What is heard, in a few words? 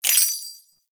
Step Spur, Footsteps; Enemies; Foley; Warframe; Duviri; Dax